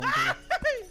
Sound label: death